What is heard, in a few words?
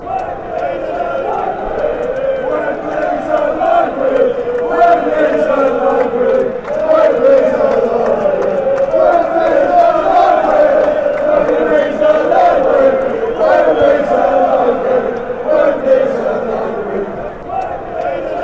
wembleys library, fan chants